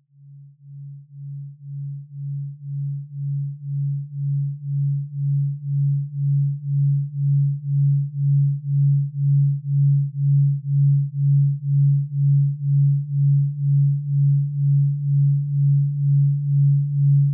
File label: 2Hz Delta Waves